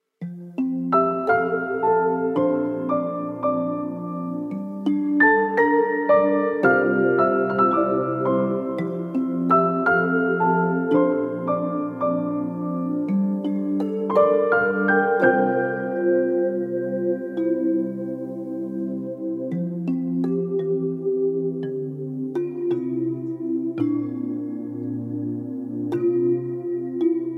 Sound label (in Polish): Wersja instrumentalna; Wersja instrumentalna do piosenki; podkład; Krakowskie Centrum Artystyczne